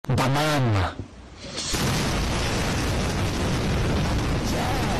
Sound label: banane arrrggghhhhhhhtt